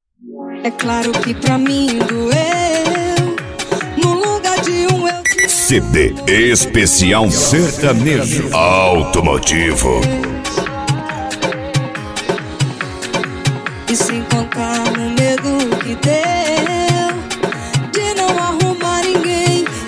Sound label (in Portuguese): sertanejo automotivo